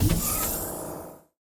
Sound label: topdoor open; p00gie